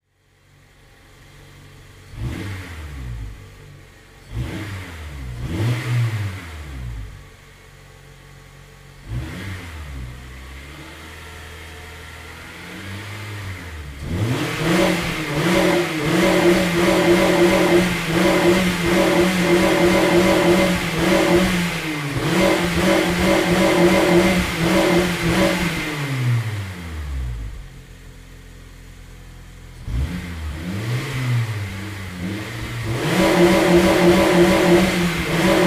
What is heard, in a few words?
Civic si Accelerating Garage